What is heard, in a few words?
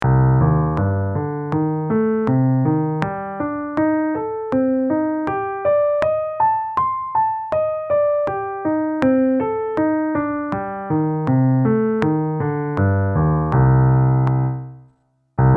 Slow n Fast, arpeggios; arpeggios for left and right hand; keyboards